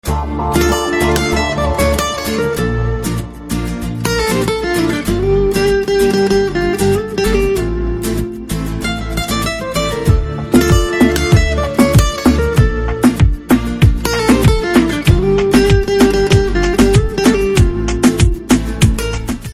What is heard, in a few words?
Hey Menina BGM Ringtone